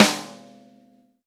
V-Pop Snare, Roland BK-5; Snares

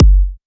Trance Kicks; Vengeance - Essential Clubsounds Vol.5; Bassdrums

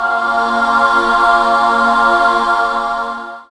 SYN DANCE, Roland; Sound & Vision - Gigapack II

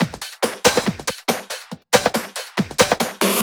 Loops 140 BPM Hihat Percussion Loops

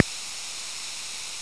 Snare, drum hits; Sfactory; Nu Dance Electro

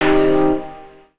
chords

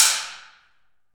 Aluminum Wall, FX Perc; Roland L-CD701

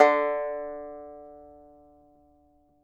banjo D3, banjo notes; Sampleheads - Whole Lotta Country 1 & 2

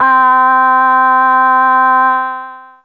Ahh C4, Humans; Fairlight CMI IIe

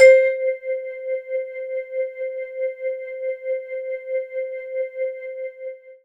C5, Synth Line

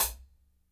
HAT, KLANKY KIT

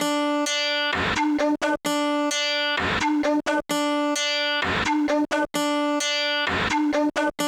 kawai k1 OMNIBUS LOOP; wavetables

